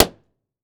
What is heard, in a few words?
Cartoon Balloon Popping